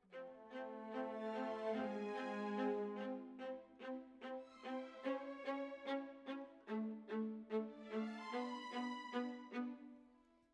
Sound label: Violas, jazz for piano